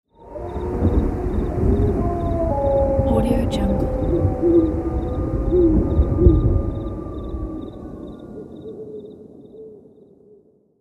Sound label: halloween forest